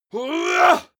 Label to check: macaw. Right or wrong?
Wrong